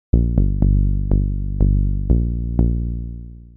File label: Bass Synth Amaj Gmin Tonic G, 122 BPM; Pro Bass Synth Studio Kit; MMB